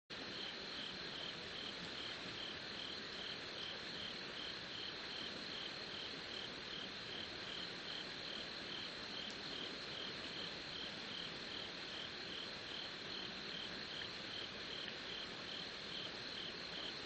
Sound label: riverside; Kyoto U; Ashiu